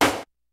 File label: kicks snare, kid; Kid Nepro; Elite; KICKS SNARES